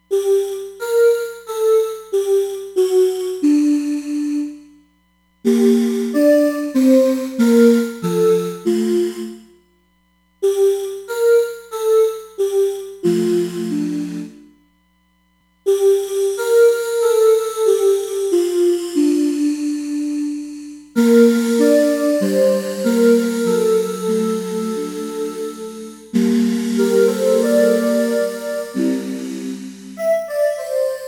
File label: Voice, Roland DEP-5; Factory Patches